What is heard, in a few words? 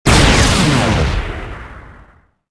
alt fire; weapons rocket